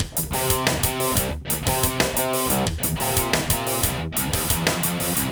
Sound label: Electro rock; ringtones; Google; NewWave Labs